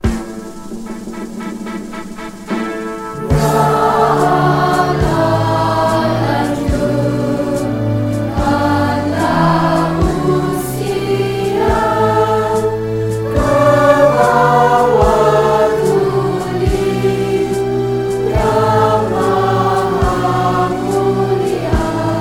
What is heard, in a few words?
national anthem vocals